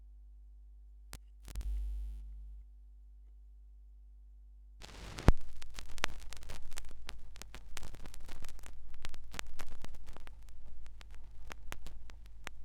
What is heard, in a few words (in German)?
Schallplattengeraeusche